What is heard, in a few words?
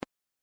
ADSR; synthese sonore les enveloppes